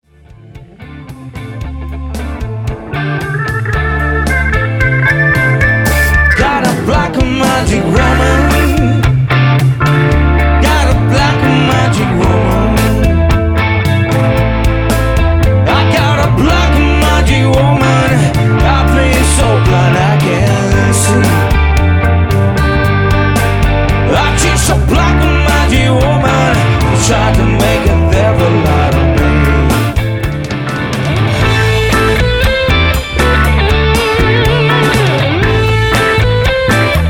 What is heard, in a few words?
Live Mix